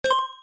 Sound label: token add; slots; gangsterallstar